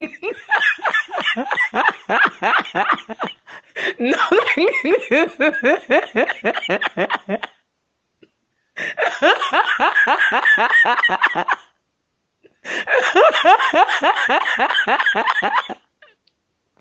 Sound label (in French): le rire